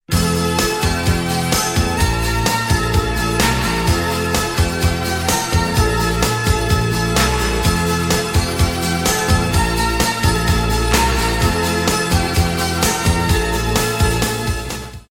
Sword Flaming

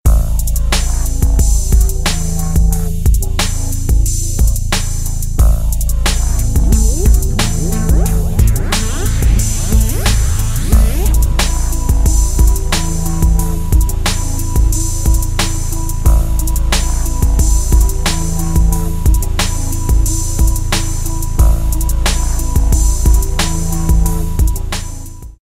groove hip hop

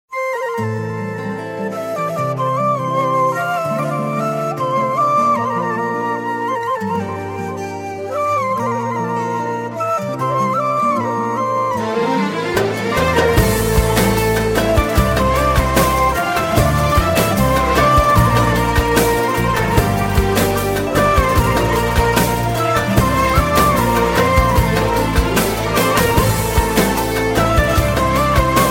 Slow Intro

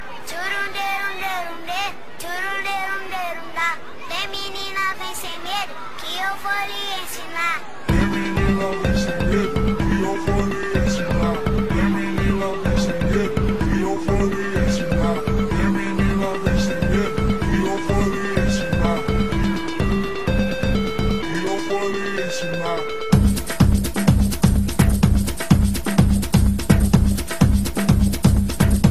Kid Conga

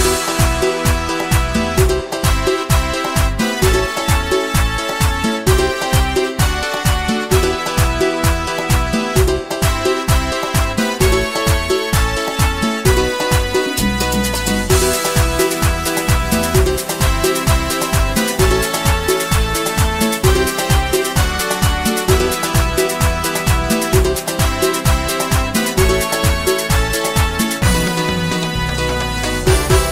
Salsa Theme

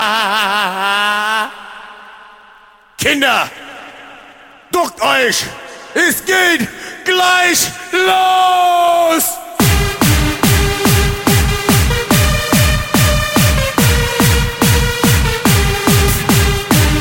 Beatz

